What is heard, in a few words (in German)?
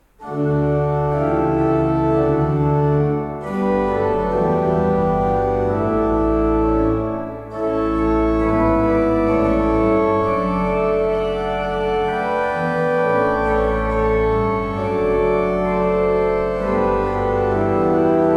Hammer 22 II P, orgel